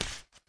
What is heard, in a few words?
shock step; stepsounds